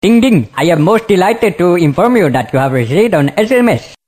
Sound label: indian style, dance